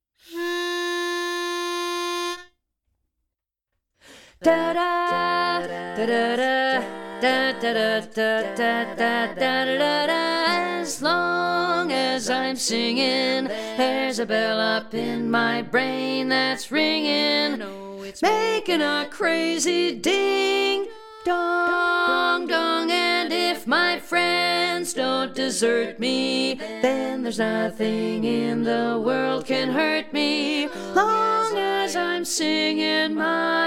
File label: lead predominant